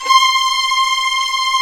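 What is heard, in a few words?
strings, Vlns 8 fff; Roland L-CD702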